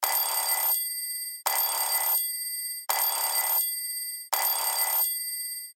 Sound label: Classic Alarm; KONKA; alarms; SP6